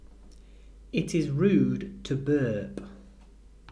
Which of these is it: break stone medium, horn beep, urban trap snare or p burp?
p burp